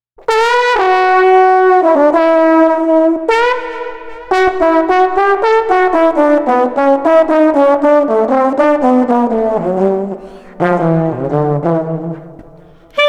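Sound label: Clarinet Trombone with Drums 110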